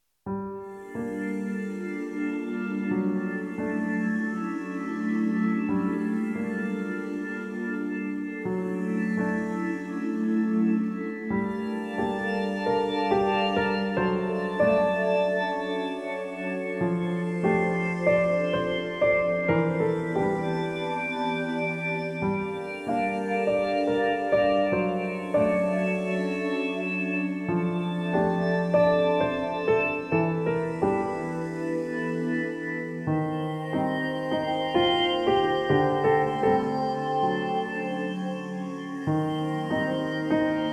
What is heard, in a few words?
two tens synths